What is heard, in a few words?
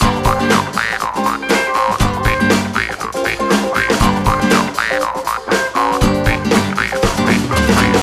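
music suspens funky stem; rayman origins 2011 gamerip; jungle world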